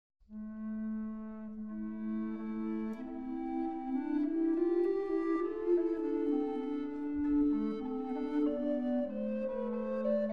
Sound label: Berlin Tenor, recorders; Baldrick Deerenberg; Bassett